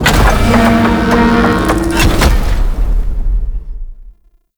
Void Dragon Ring Turn Start; Enemies; Gameplay; Warframe; Duviri

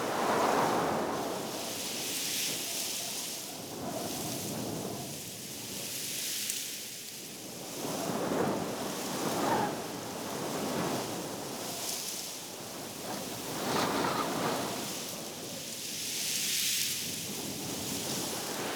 Wind Grass Small; Ambience; Props; Warframe; Eidolon Plains

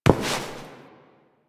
Step; Warframe; Koumei

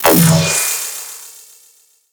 Max Status Enemy Hit; Warframe; Warframes; Helminth Abilities

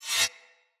Saw Dagger Whoosh Accent Heavy; Weapons; Player; Swords

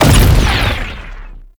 Shred Explosion; Warframe; Temple

